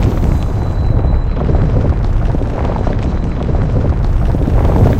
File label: nearWin loop; gonzosgold